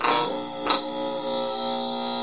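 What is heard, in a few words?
combat robot charger; hl2; combot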